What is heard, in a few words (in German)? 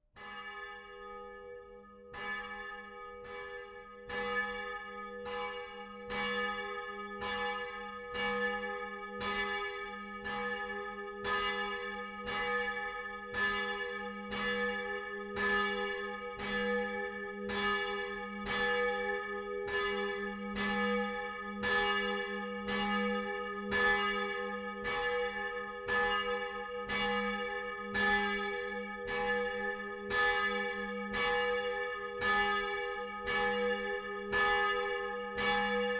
Glocke IV; St. Paul Fürth